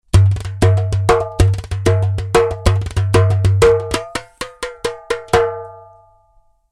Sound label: darabukka steel